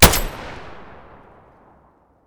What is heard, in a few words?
muzzle sv98 mono distant